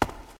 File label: cave grounds step; Golden Crayon Sounds